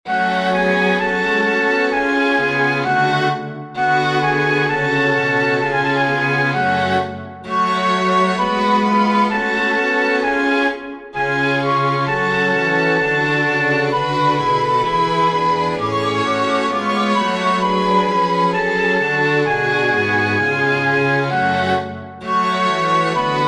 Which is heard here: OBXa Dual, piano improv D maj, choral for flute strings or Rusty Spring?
choral for flute strings